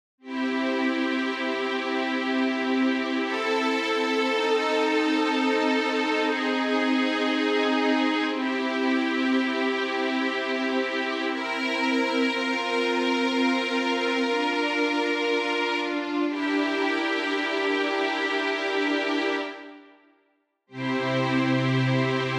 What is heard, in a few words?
Poly Strings